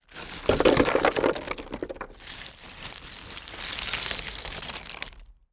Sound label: foley small debris rubble movement